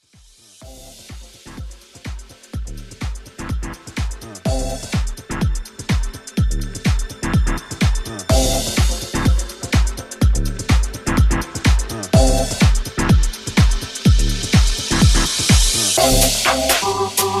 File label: 90s Dance Deep House 125 BPM